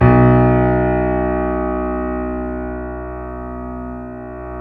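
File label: keys, YC7 piano pp; Rhythm Section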